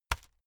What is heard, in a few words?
hit plastic fleshy soft